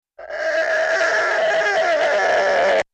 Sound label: cartoon squeek